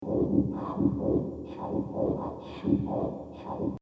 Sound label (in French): percu sourde; Loop Mania Sampler Pack